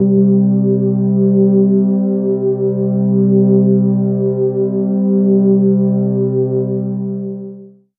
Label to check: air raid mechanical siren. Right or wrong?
Wrong